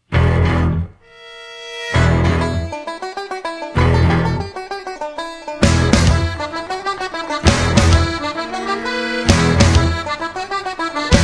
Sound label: Irish song